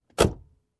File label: Compartment close